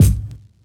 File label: drumbreak kick